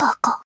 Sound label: combo break; osu